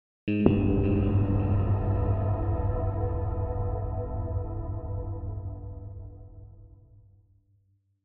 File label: Hit Mysterious Guitar Tunnel Tail; Trailer; LYN115 Trailer Production Elements - Hit 13